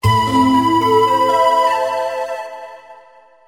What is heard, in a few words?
Pipe